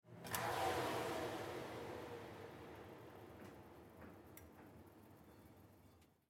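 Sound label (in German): Ofen öffnen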